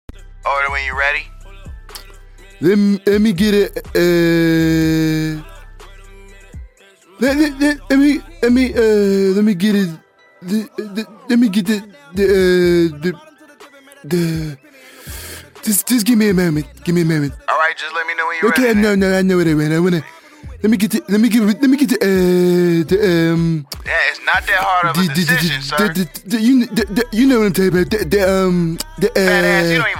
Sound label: Ringtone